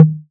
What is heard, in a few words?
Tom Slam Dam; Drums